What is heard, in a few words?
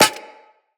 Bullet Impact Generic Metal; Weapons; Surface